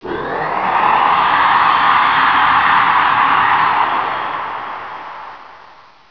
TUNNEL

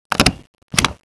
STAPLER

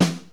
SNARE

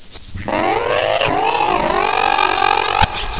BROKEN

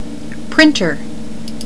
PRINTER

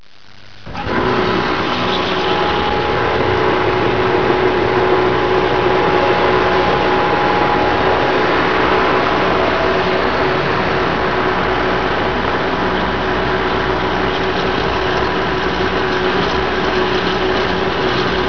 TANKEN

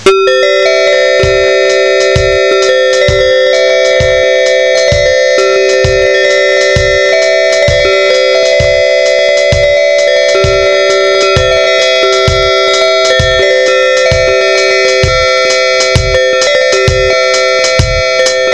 bells

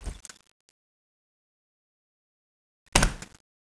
open door

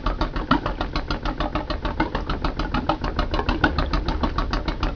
GURGLE

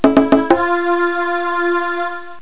ta ta ta ta

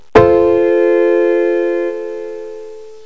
PIANO CH5

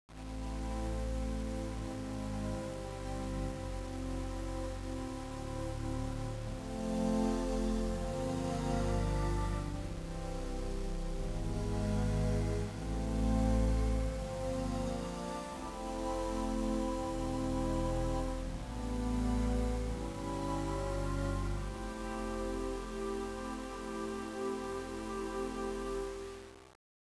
STING

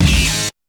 SHOT

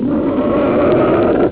MONSTER

